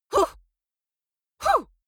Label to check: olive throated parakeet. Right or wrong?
Wrong